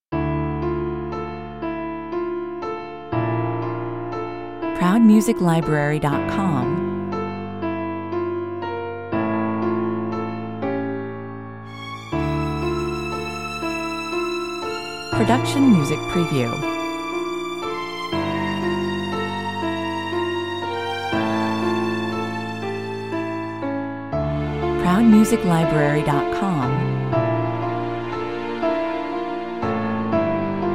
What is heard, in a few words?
memories (no drums) 120 bpm